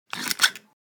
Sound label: Rusty garden shears cut snap